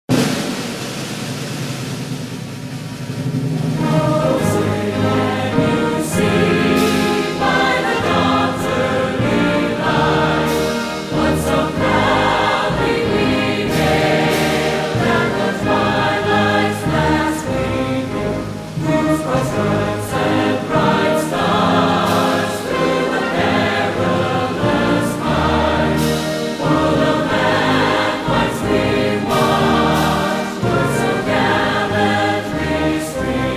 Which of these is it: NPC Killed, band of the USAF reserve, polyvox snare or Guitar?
band of the USAF reserve